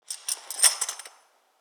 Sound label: purchase, Sound effect made by jingling coins; Purchasing Sound Effect